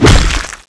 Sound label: axe slash hit, weapons